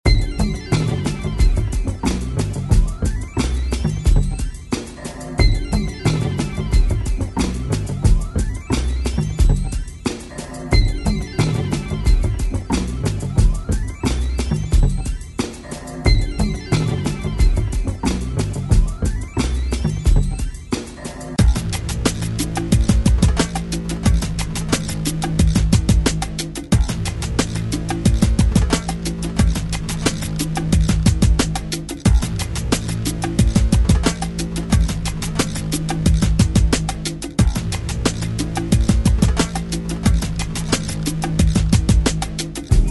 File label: pilates world grooves